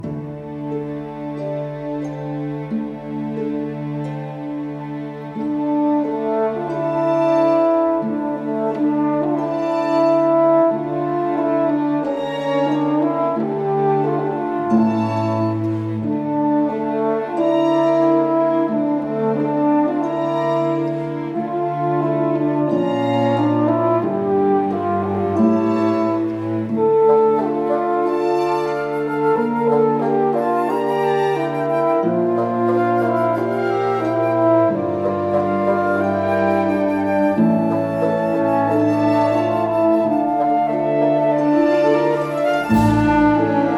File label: Orchestra